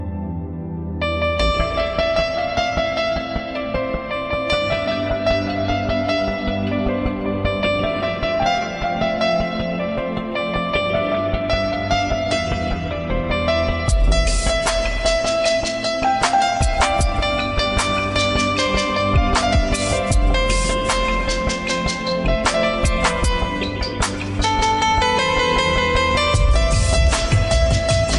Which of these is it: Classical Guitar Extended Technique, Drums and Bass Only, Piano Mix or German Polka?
Piano Mix